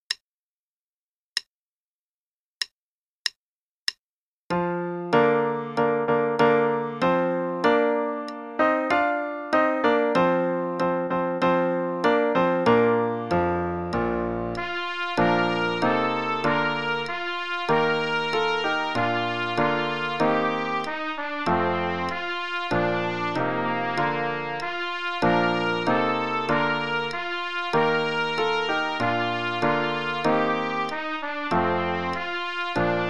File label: Play-a-long both parts, qn=96, trumpet; Belwin; The Coronation Day